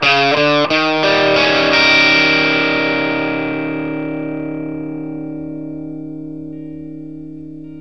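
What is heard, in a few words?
PICK E flat 7, Vintage Blues Guitar; USB Soundscan vol.22; AKAI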